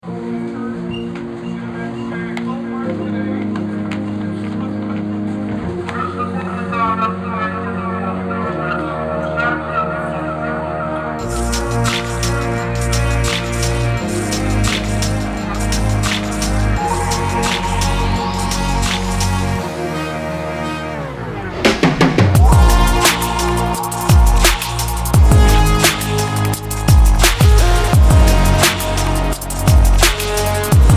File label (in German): Musik